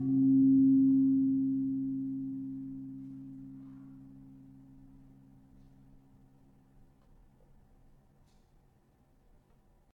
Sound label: Gong 4 C Sharp, gamelan tuned; gravityharps